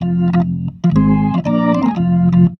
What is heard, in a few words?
B3 LICK F; B3 PLAYED